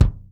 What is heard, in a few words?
SHORT DRY, kick drum; Sampleheads; New York City Drumworks VOL-1; SP KICK DRUM